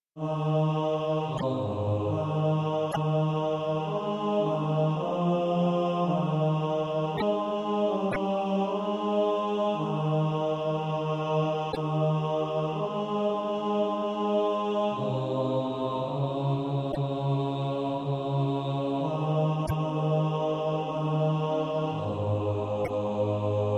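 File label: bass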